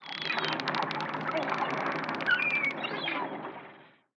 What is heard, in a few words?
war wharf; PHARAOH